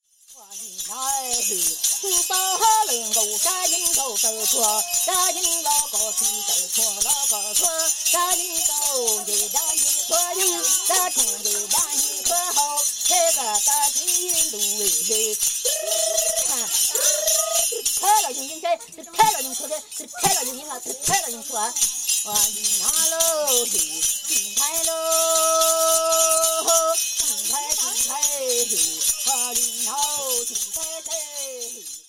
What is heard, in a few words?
Hmong Soul Retrieval